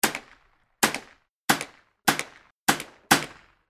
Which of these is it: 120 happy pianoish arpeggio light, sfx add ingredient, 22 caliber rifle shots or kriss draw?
22 caliber rifle shots